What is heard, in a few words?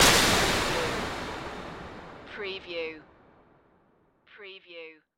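Production Element Hit Scary; Sound Effects Bible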